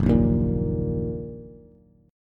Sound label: Gb 6th Gb Bass; strummed